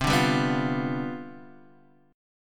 C Major 7th Suspended 2nd Suspended 4th, C, down, Guitar, Open D